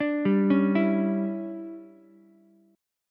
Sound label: D Major 7th Suspended 2nd Suspended 4th D down Ukulele; DM7sus2sus4; arpeggiated; JGuitar